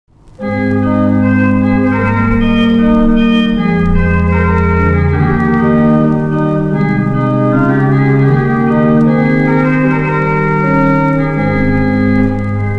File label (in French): Cornet, orgues